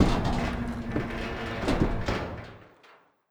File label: door large metal close end; Rust